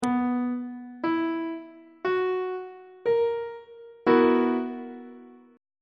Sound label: c flat major 7th suspended 4th chord; on treble clef